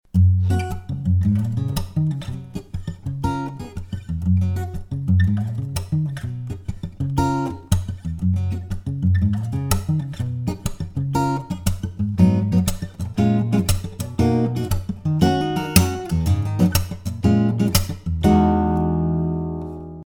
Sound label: cedar top soundsample; dilemma prototype